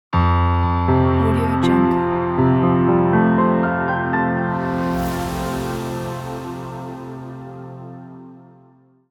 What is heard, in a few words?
media logo